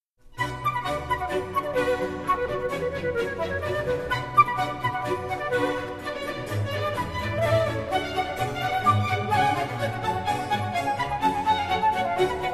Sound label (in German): orchestersuite bwv 1067 ouvertuere h moll; baderine